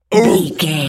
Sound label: seal walrus hurt